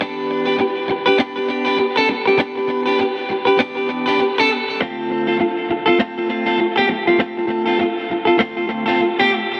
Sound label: E Gtr Squishy; Zero-G; Future Wave